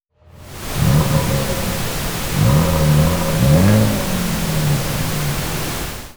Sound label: Rev pink